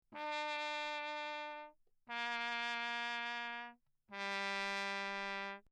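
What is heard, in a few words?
trumpet mute Lechner low; D Wick; Perinétventiltrompete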